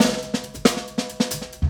LOOP SD, Drum & Bass, 140 BPM; Ueberschall; Drum & Bass Flash Trackz

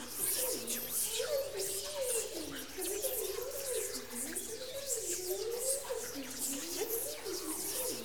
WHISPER; WHISPERS

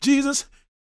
gospel male, vocal; ILIO; Vocal Planet

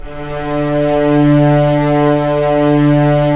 STR SC, Roland LA Composer

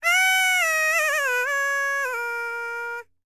BULGARIAN, World Voices, BALKAN

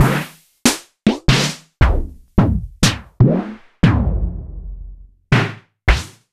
Sonor Mini Mammut Module

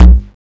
Synth Kick

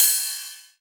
Rides, Cymbals

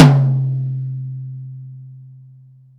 TOM, Drum Dry; East Collexion; Drum 1

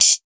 Big Beat Drumloops, 138 HHO; USB Soundscan vol.25; AKAI; MOVE 138

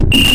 ALARM FX; Big Beat Samples & More; AKAI; ALARM KIT

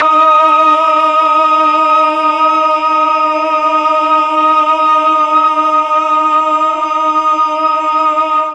D#3 8VOICE CH, Mellotron; 8VOICE CHOIR; Mike Pinder - Mellotron Archive CD

